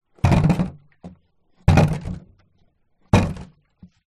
foley plastic water tank small down on ground water inside x3; zapsplat